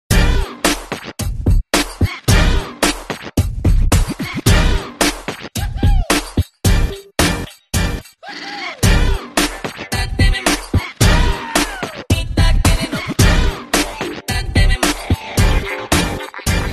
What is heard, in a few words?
trending bgm ringtone